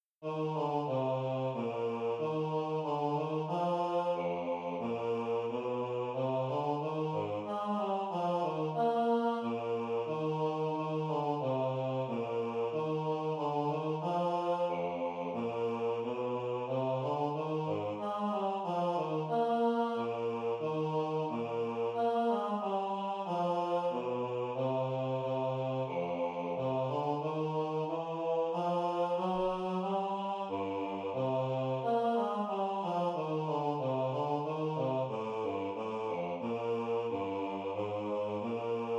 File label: bass alone; hymnal 1982; 91 break forth o beauteous heavenly light